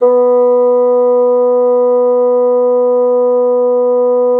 bassoon B3; Xsample VOL-6

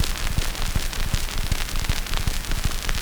Edison Cylinder Noise; Continental Drift Aftershocks

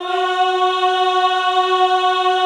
EHH F#3, Classical Choir; Best Service

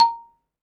XYLOPHONE, Percussion; ILIO

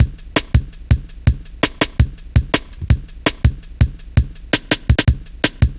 Tin, Jungle, Drum Loops 165.5; Zero-G Jungle Warfare 1, 2 & 3

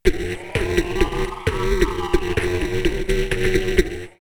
Blue Alert 129, drumloops, Rave; X-Static Goldmine; 90 s Sample CDs

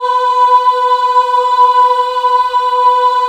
B3 WOM AH, Choir; USB Soundscan vol.28; Acoustic & Synth; AKAI

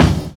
60 S BEAT; Univers Sons - Kitch 70s

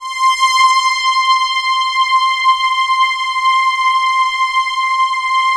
DENSE, PADS; Masterbits; Vintage Voltage

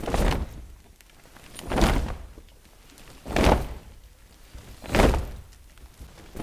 HUM STOMP, Stompin' Feet; Roland LCDP14 Africa VOL-2

